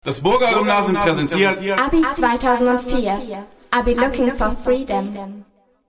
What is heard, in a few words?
Vocals